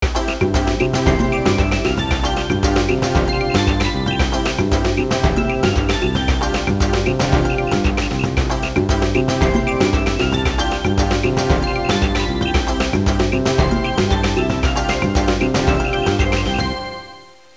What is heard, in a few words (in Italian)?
suonerie contemporanea; musica contemporary